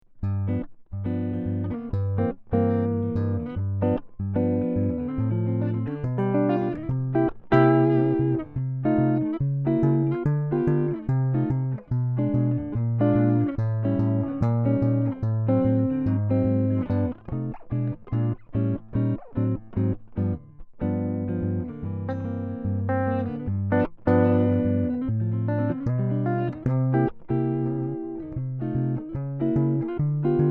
345 Jazz, Gibson ES345

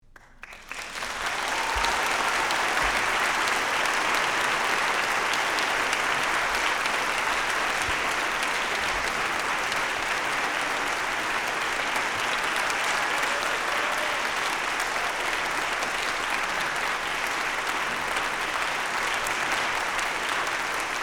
Crowd; AMI UM25C

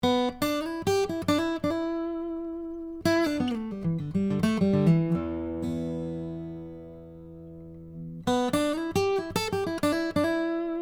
C414 Boogie; SANTA CRUZ OMPW; CARDIOID; straight signal with no additional EQ or effects; C414 COMB

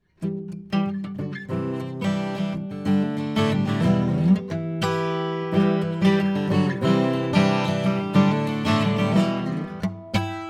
Harmonic, Guild12; Harmonic / (Original, in Em)

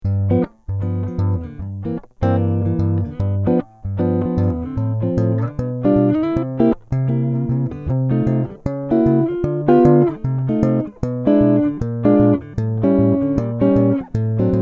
Jazz, GibsonLPC

